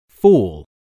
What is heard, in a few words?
fall